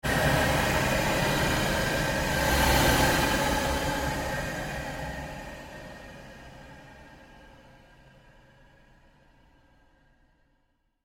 Created Surge Fade, sound effects created by manipulating other sounds; Rises and Dives